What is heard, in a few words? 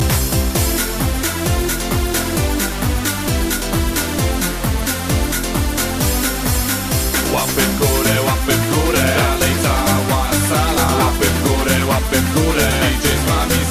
Q-bass Dj Brush